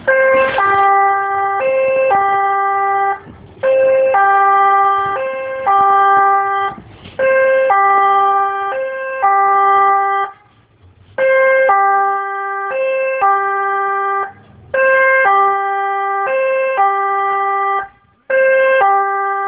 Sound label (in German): folgetonhorn; johanniter akkon